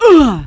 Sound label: pain, player; QUAKE2; female